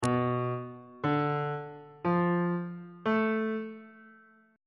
b half diminished 7th chord intervals on bass clef